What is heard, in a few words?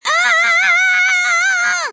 death; tails